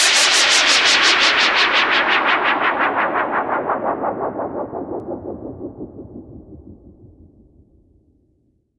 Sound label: FX Downlifter